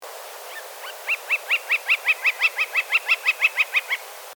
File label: Gilded Flicker, Colaptes chrysoides; woodpeckers